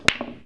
ball hit, 9ball; wingame

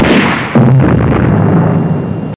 ss missle; lem revenge

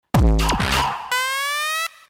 concert; MOBICLIC63

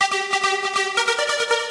plasma, 140bpm keyboard; hous-tec

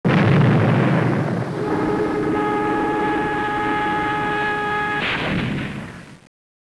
explode & crash; Achtung Spitfire

